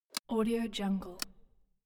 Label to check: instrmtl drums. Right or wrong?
Wrong